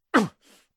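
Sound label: moan, male; Interactions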